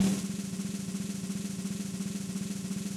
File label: Snare Roll; Yamaha DTXpress-II